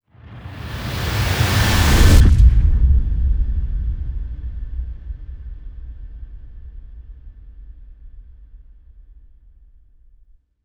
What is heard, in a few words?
Impact Noise to Sub